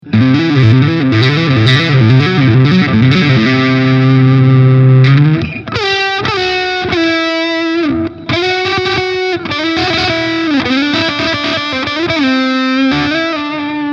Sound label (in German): EVH Shark Hals; 2 Panamesk